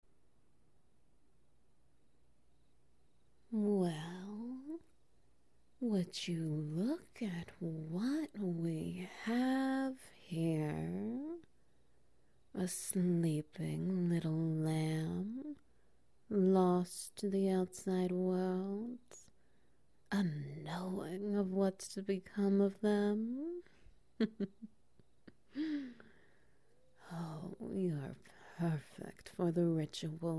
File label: Ambience; The Lure